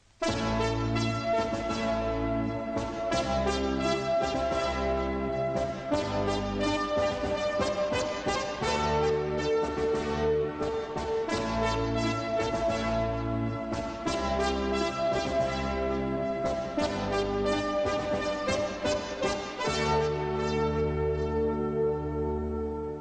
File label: Fanfare